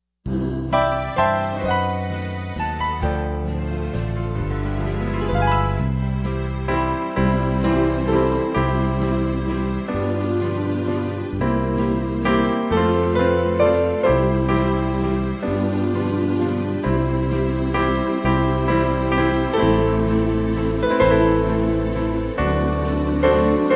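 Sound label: Key B, Free Music Backing Files for Churches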